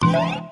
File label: gameover; paper dash